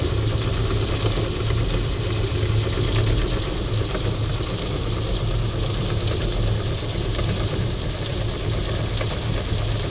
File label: 5kbump Equipment Rattle, ship noise